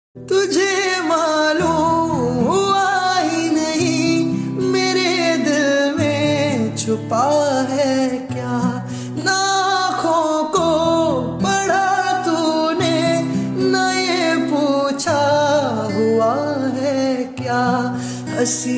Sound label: Ringtone